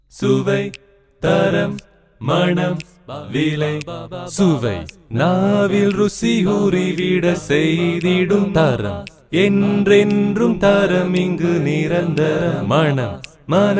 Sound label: acapela